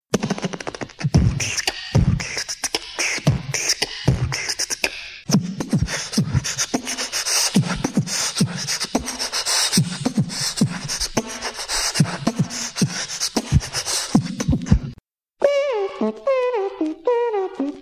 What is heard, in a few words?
Beat Box